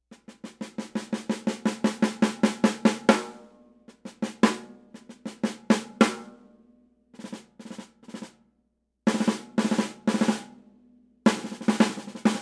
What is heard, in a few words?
5x14 Supra, Ludwig